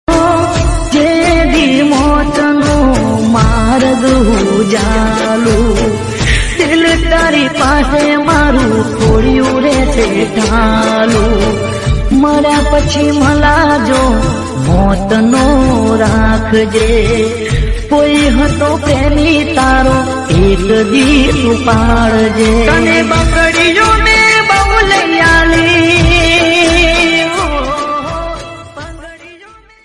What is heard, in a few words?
Gujarati